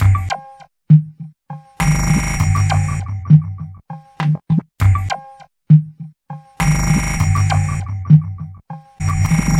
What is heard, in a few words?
Aether Beat; Sonic Foundry (Sony Creative Software); Loop Noir; Session 12 - Otherworld Journey